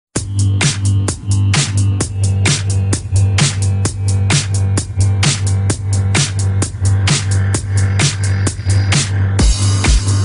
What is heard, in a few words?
Instrumental Nice Bass; Outdoor Raves